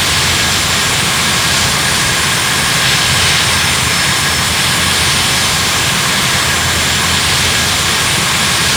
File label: Aircrafts Engines Su25 Front Engine; DCS